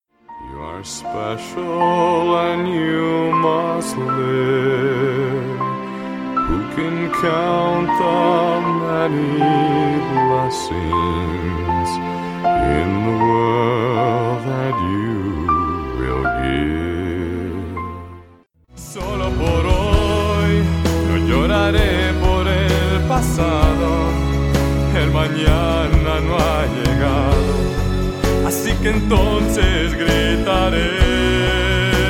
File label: spanish singing